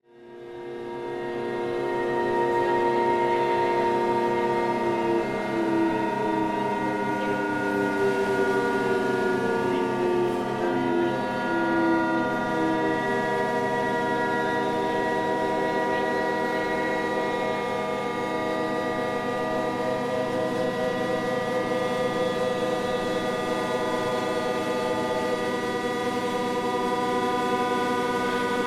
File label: freeze choir